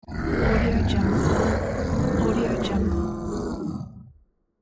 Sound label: evil zombies